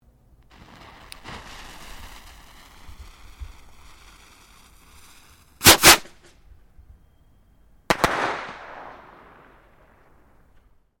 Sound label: Fireworks Skyrocket Double Launch Detonate, Domestic Fireworks; Fireworks (Sound Effects:Backgrounds)